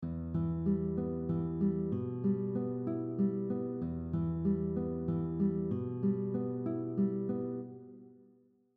Fingerpicking exercise 4 combining movements, guitar; between the 6th and 5th string while your other fingers also continue to move around the top strings